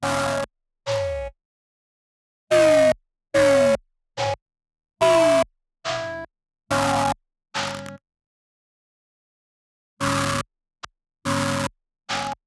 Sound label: Glitch Bit Drums